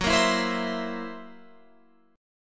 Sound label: Gb 7th Flat 5th Gb down Guitar Open F; Listen to Gb7b5 strummed